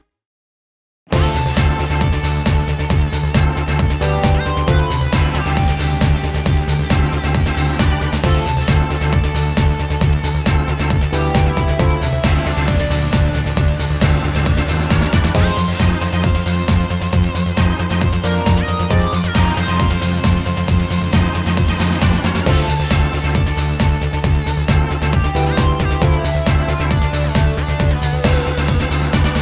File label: Turbo Duel Music; YuGiOh Over the Nexus